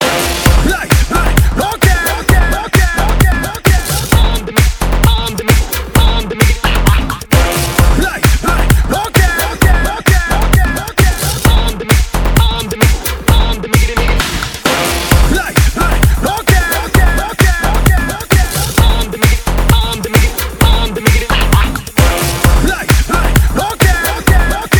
Electro Club

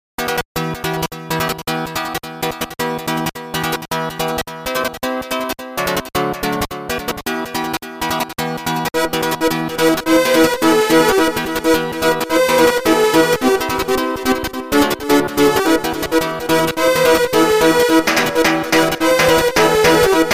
gitara lezginka

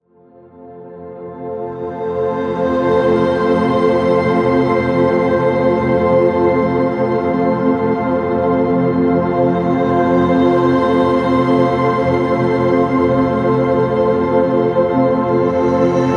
Ambient Tones

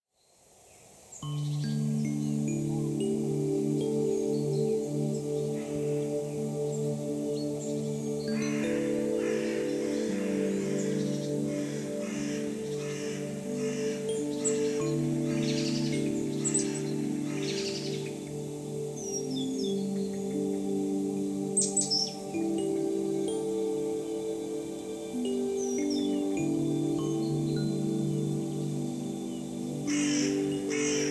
White Noise; Relax Meditation Sleep Study Concentration

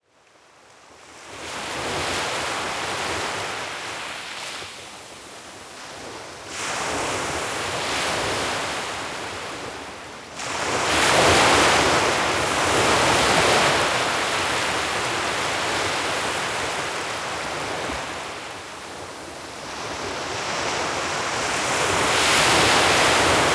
Ocean Sounds Beach Waves

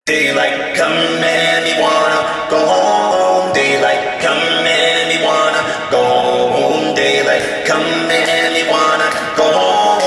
Beatbox reverb; Verbalase

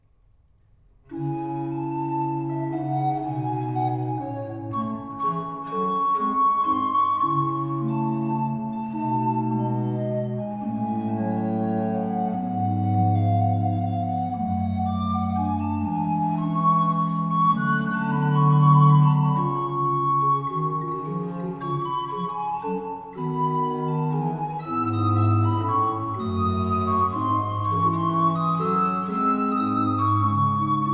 Sonat Vivace e largo Spiritoso; Bologna 1693

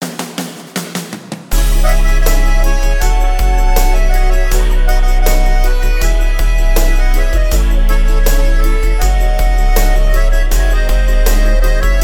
33-37hz Low bass

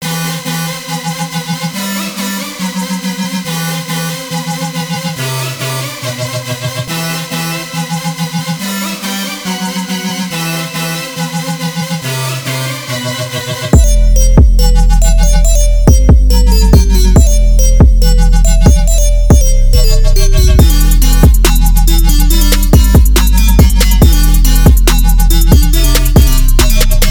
140 Bpm Dubtrap

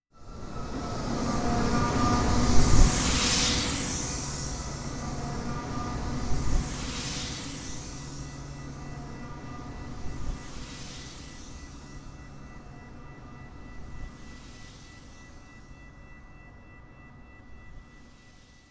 Ultra Train Whizzing By

